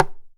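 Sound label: Percussion Tabla; Drums; Acoustic and Electric; Polyend; Simulacrum Sounds; 1 Shots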